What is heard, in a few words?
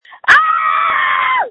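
Scream, phone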